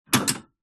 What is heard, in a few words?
animals mouse cage metal cage hatch open